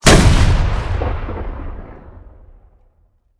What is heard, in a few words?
fire, rocket; gmod; arccw uc commons attachments